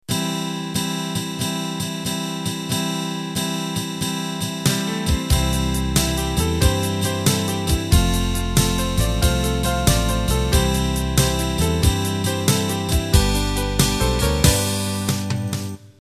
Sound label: Unplugged Slow Rock Intro